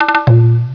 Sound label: Tablas